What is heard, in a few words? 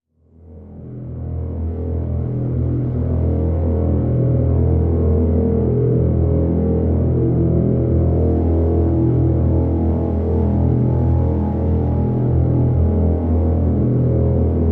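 Drn Dormant E, drone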